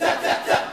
ETHNIC SHOUT; AMG; Global Trance Mission VOL-2

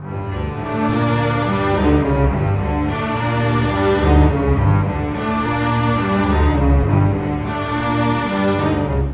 Bass, Cello Viola, Cinematic; Sonic Foundry (Sony Creative Software); Orchestral Series VOL-3; Cinematic 11

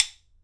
KALAAW, HAWAII PERC; AKAI S6000 CD-ROM; WorldSounds

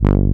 JX BRASS, Jupiter Synths

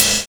LOUD KICK; Univers Sons

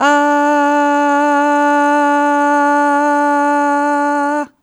Aah Long D3 Dry; Harmonic Building Blocks

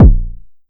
Bass Kick, Jomox Airbase99, Drum Machines

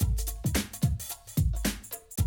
110, Classic Drum Loops

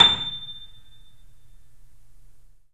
3D Pianos, Boesendorfer; E-MU Producer Series Vol. 5 – 3-D Audio Collection

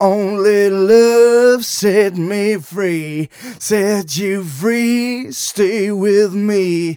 130 mel g, Vocals

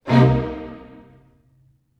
str chord c min7; PowerFX - Downtown Orchestra; Orchestral arrangements

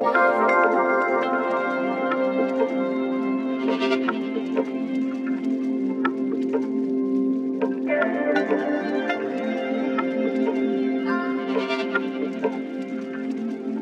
Pads; M8

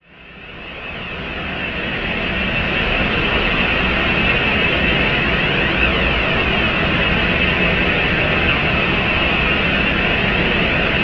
AMBIENT DRONE; Zero G; Malice In Wonderland

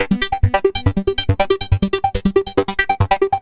Arp 140bpm G, Trance; Zero-G Trance Inducer; Arps 140bpm; 90 s Sample CDs

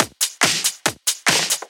Break Beats, 140 BPM

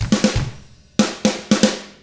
ROCK

